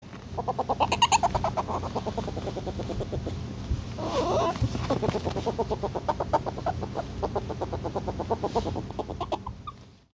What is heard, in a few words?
marbled polecat